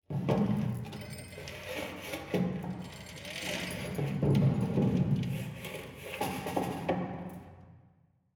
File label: hangar layer